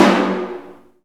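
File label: percussion tympani, techno percussion; Roland L-CD701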